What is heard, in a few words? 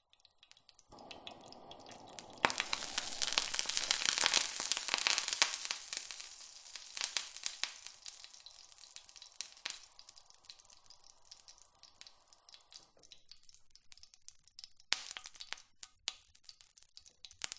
household oven pan oil water mix gas sizzle big spits